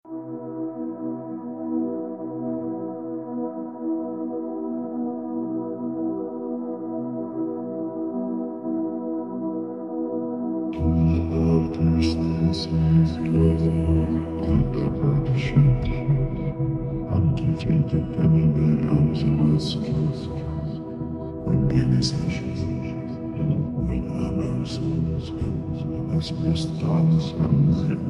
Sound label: Tranquil Reflection Soundscape